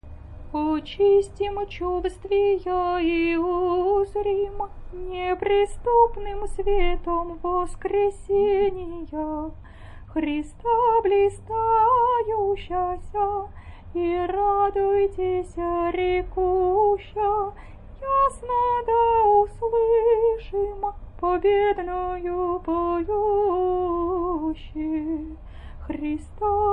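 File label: easter canon byzantine slower, singing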